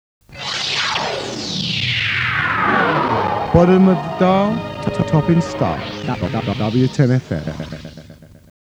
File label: Fm Radio jingle